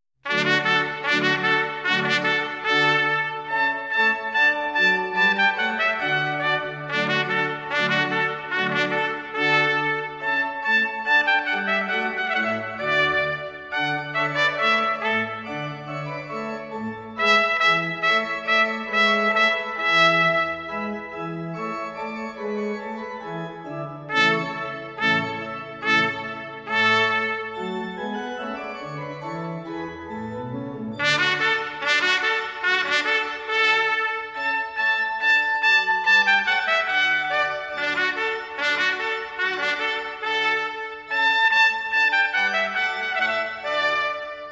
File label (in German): trompete u orgel rondeau; telemann